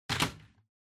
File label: Vacuum Cleaner Head Put; 掃除機04-20(ヘッド 置く)